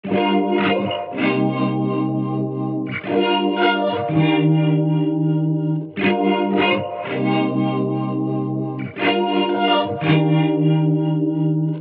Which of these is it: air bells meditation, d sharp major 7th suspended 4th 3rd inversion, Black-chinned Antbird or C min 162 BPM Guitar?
C min 162 BPM Guitar